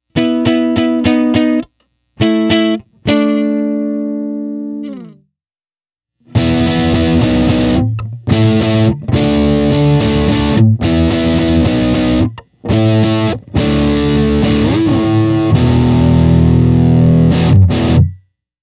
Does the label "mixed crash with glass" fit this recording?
No